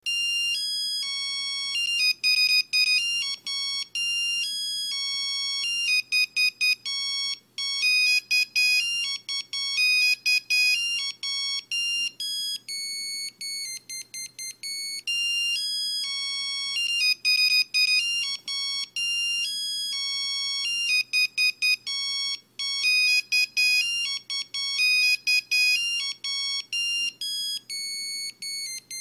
Nokia Retro

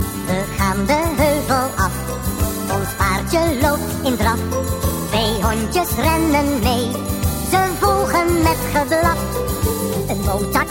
Jingle Bells

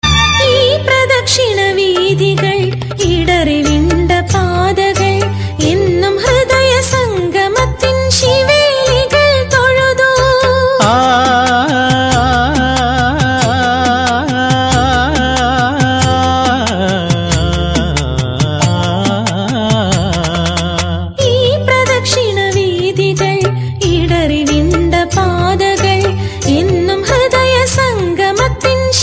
Mallu Love Song

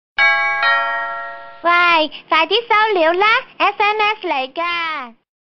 Cute Doorbell Sms

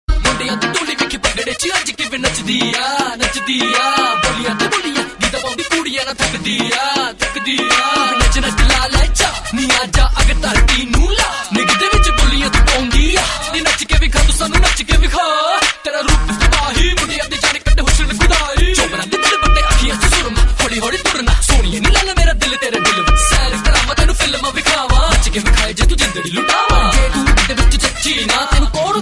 Rap Punjaban

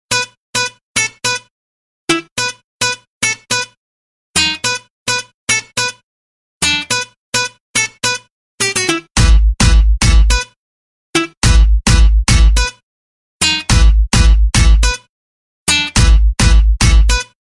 Music

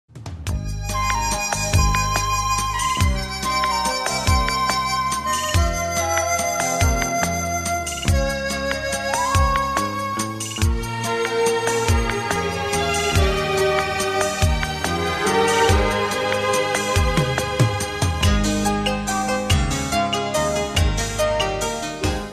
Harmonica

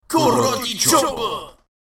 Karate Chop

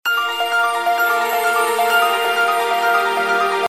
Magic Sms

